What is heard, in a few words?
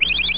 Chirp; Mosaic Magic